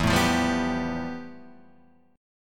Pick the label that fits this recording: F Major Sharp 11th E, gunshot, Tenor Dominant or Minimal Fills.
F Major Sharp 11th E